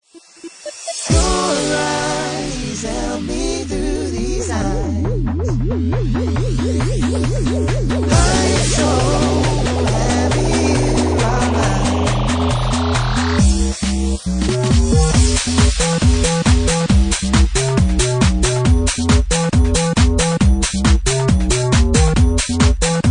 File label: Bassline; Bassline Meltdown Session 1